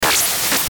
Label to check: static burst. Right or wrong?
Right